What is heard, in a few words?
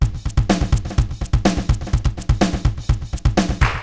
80s delay Akai XE8 (125BPM), cyborg beats